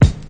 Studio Kick Drum Sound E Key, Royality free kick drum tuned to the E note